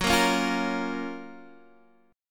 Gb Major 7th, down, Guitar, Standard; strummed; JGuitar

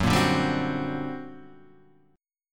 G Minor Major 11th, F sharp

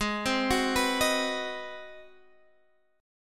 Ab 7th Sharp 9th Guitar Standard, chord; Ab7#9 arpeggiated; JGuitar

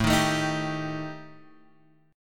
Eb Major Flat 5th

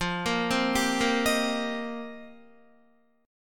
F 7th Suspended 2nd Suspended 4th, Guitar; F7sus2sus4; arpeggiated; 13,13,10,12,10,10

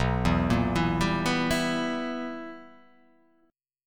B Major 7th Suspended 4th Sharp 5th, chord

